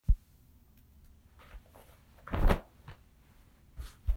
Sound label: Clothing Ruffle